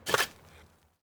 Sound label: put pizza oven; anemoi